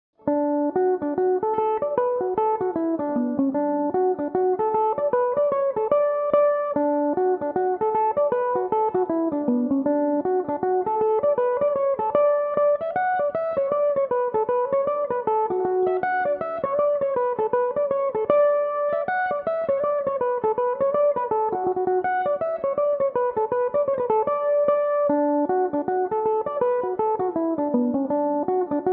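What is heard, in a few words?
knocktoran fair D major, irish mandolin; Belmuse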